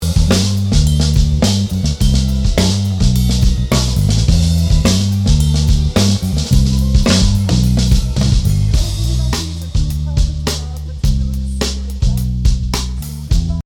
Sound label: full over comp, batterie; avec un son plus adouci